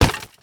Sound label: splitting wood, chop